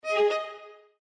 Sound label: Windows Exclamation